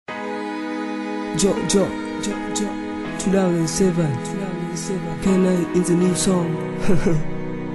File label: rap; viet rap